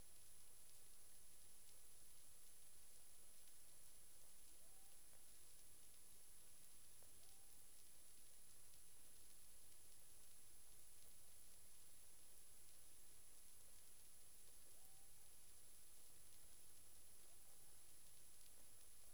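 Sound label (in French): CLIC DAUPHIN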